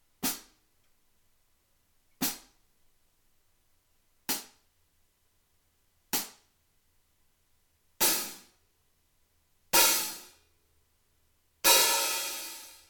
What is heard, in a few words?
Hi hat 14; Amedia; Thrace